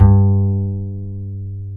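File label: double bass G-2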